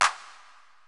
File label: 505 Drums, Roland TR-505